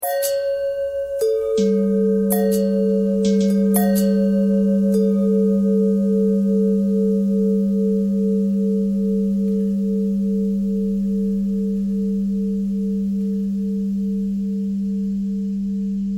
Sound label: long bell bend threads; bells